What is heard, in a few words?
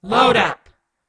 camera; midnight guns; bootsector